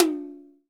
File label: TOM M, Akai MPC2000